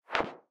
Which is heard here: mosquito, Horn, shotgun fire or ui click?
ui click